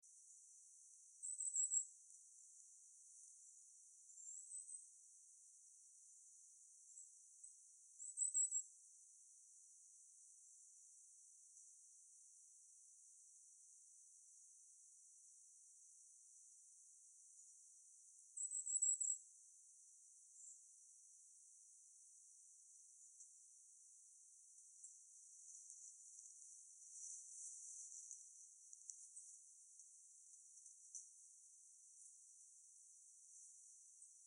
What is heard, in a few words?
firecrest calls, hockley woods